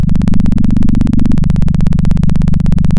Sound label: Sound of a plane taking off